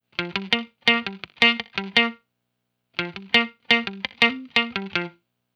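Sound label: 085 Acid Jazz Single Note Guitar C major; Pro Tools Essential Loops; Digidesign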